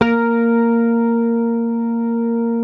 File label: Bass, HAMER12 SUS